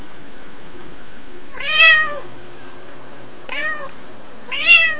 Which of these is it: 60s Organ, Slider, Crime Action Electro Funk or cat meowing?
cat meowing